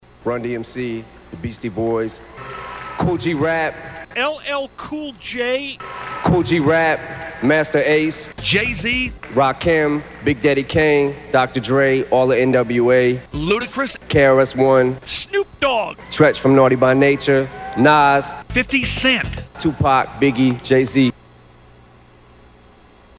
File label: rap list